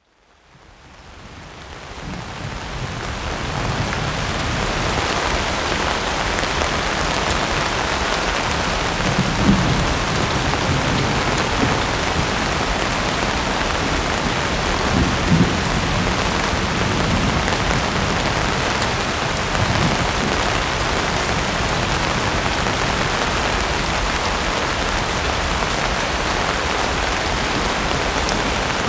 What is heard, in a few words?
Nature Sounds Relaxing Constant Rain Storm with Distant Thunder